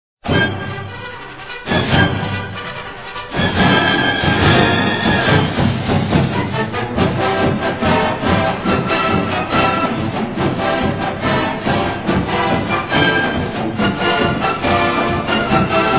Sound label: Fight Song; North Texas UNT